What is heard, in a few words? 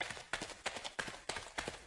footsteps gang; Be Cool Scooby-Doo! - It's Dark Out There; ThrillGames